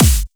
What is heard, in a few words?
normal hit clap